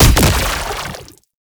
Shotgun Explosion; Warframe; Tenno; Alchemist

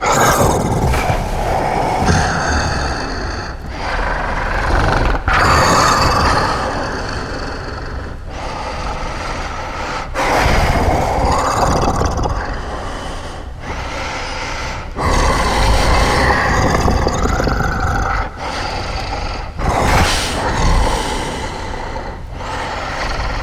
Breaths; Warframe; Tau TC Demo; Amar

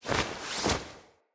Foley Brush; Corpus Lich; Idle Screen

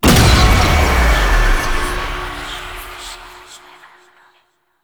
Apparition Impact; Warframe; Dagath

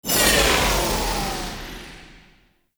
Speed Ability End; Warframe; Warframes; Helminth Abilities

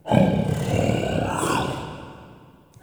Interior Light Burps; Ambience; Indoor; Warframe; Infested Eris

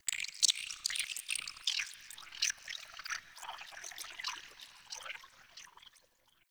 tunnel critter; Warframe; Tau

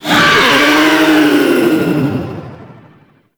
Tau Sentient Dactolyst Use Ability; Warframe

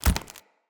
Mockery Foley Melee Swing; Enemy; Mockeries; Characters

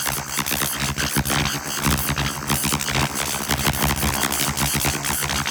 Arachnoid Wraith Prism Spin Loop; Warframe; Corpus; Abilities

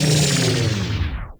Ring Rotate Large Slow Stop; Warframe; Orokin

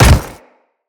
Step Default; Grineer Leto

